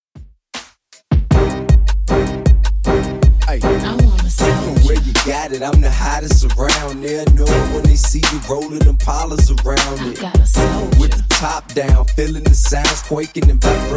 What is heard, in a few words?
Throwback Rnb Redrum 78bpm